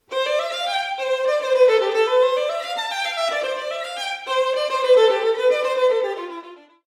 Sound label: violin